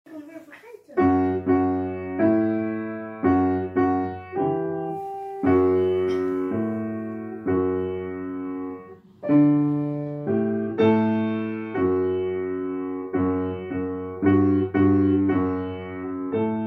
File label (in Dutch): improvisaties requiem